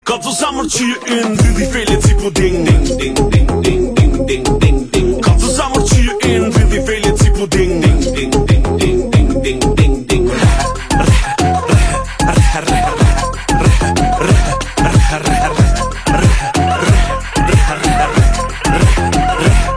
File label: Ding Ding